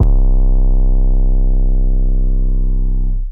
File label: NMV2Bass808; 808s